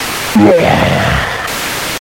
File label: Monster Death